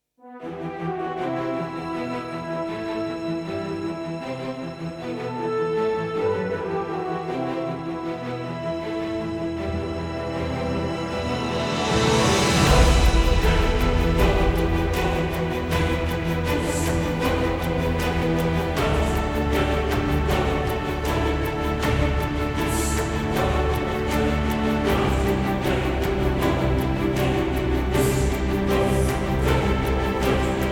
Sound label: No Vocals